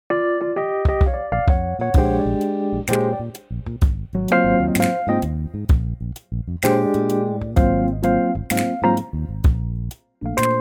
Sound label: Bb smooth